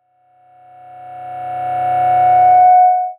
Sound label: Transition Reverse Surge Reverse; Production Element